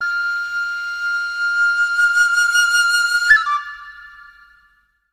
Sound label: flute attack